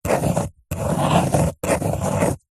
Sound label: office pen ballpoint paper hollow table draw diagram